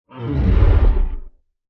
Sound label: Dinosaur Breath, Animal, Dinosaur, Breath, Creature; Dinosaurs (Sound Effects:Animals)